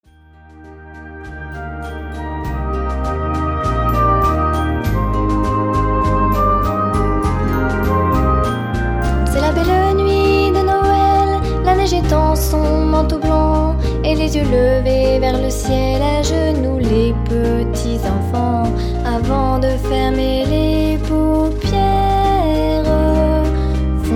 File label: kids french Song